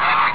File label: bird